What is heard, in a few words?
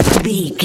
footstep gravel land